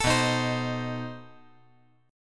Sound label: B 7th Flat 9th B, chord